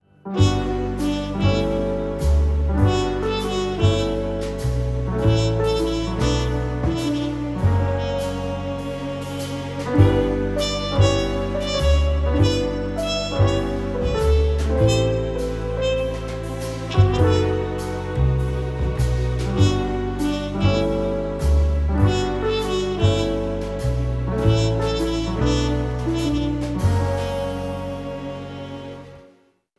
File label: smooth jazz